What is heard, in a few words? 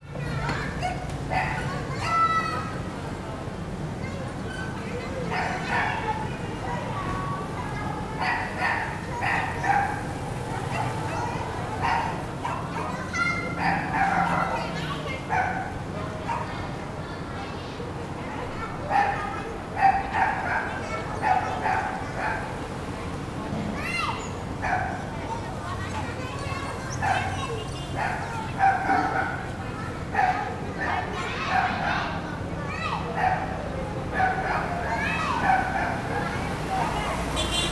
Kid Dogs in Phnom Penh; CAMBODIA; Les Cartes Postales Sonores; NOISE ON EARTH Vol1